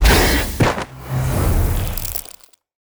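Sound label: guardian Bastet death, monster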